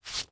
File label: Hand Rubbing Shirt One Time; foley